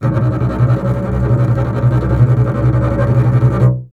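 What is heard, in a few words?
string contrabass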